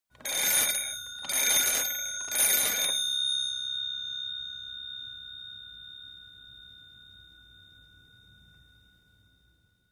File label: Old phone call